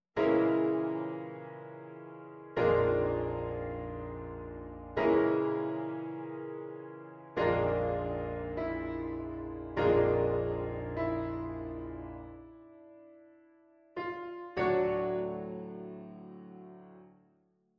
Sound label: debussy chords; Impressionism